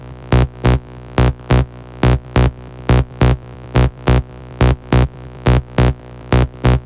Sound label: Melody, 140BPM; Genetic Melody 1